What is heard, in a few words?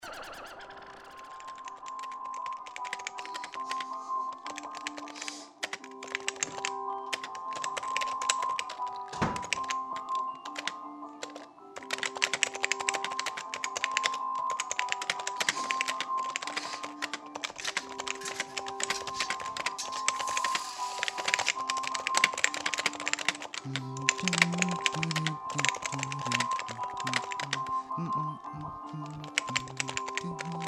Hum The Beat